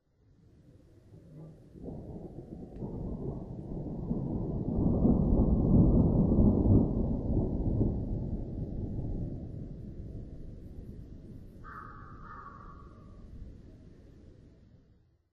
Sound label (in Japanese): kaminari